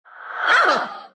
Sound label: pain; dota; death prophet